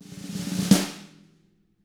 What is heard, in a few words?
snare roll; ILIO; Double Platinum Drums 1